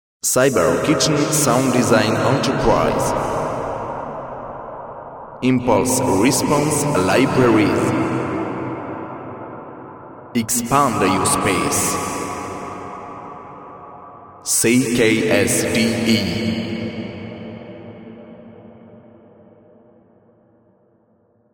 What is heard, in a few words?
DEEP DAMPED CAVE